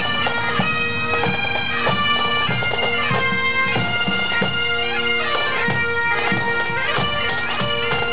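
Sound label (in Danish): Sakkepibe Dragør Kirke; Befrielsen Dragoer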